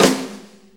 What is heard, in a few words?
4-4 Snare Flam, Drum Loops